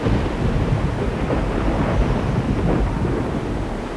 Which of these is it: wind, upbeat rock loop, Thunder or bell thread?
wind